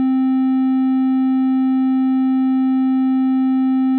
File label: simple tone